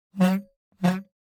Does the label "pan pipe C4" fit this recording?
No